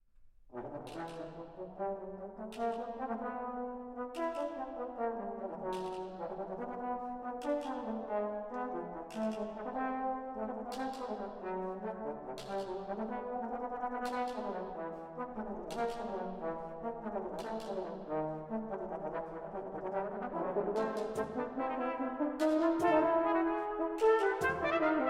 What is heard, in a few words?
Brass; Maria De Buenos Aires Suite